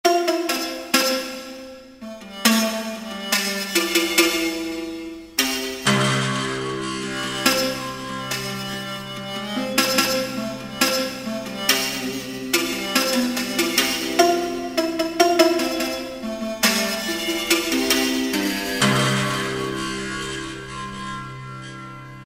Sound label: JAKAY - Thai Zither; FRETTED INSTRUMENTS; v-s, mf and mp; Spectrasonics Heart Of Asia